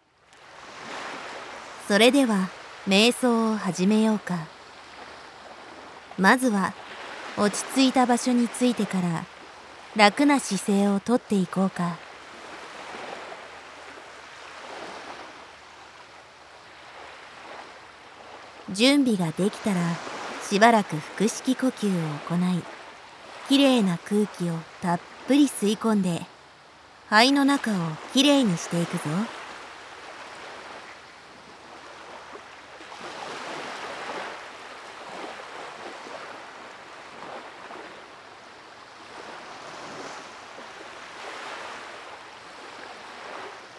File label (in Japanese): 瞑想ショートワーク 海